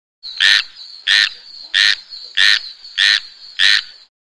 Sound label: Frog Tree Croaks Mix